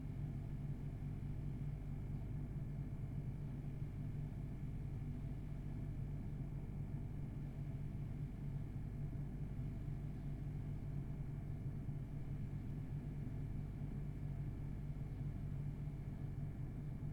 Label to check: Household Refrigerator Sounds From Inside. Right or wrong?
Right